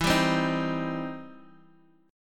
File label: E Minor Major 7th Sharp 5th E